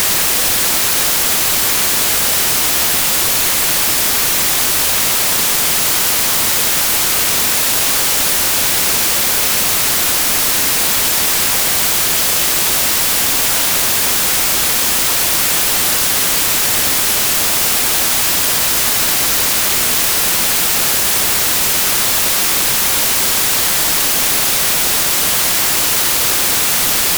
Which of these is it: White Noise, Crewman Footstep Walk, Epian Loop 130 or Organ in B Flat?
White Noise